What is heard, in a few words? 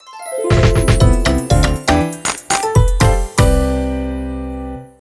Jingle, Purchase Complete; streetpass mii plaza; 3ds